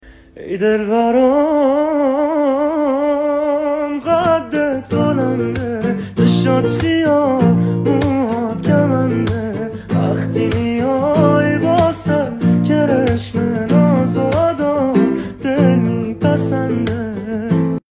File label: guitar